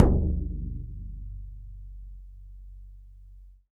BODHRAN

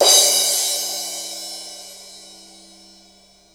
KOMMERZDRUMS

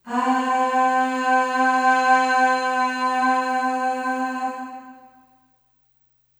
Aah Long C3 Short Reverb; Harmonic Building Blocks

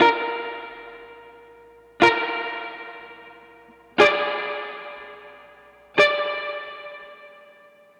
Guitar, 70s Analog Funk

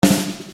Snare Dr

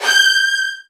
Virtuoso Strings, G4; ILIO; Kirk Hunter Virtuoso Strings VOL-1

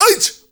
male solo, male noise

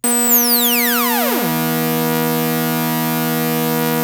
ARP 2600 B3, synthesizer; Hollywood Edge - Giorgio Moroder Rare Synthesizer Collection

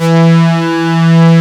OB STRINGS; E-MU Formula 4000 Series Vol. 3; Analog Odyssey

